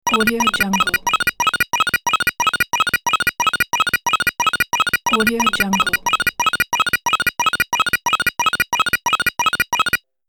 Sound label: scoring counter tally for games